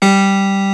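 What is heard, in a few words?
PIANO CLEAR G2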